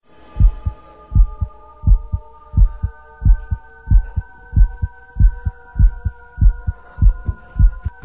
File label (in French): battement coeur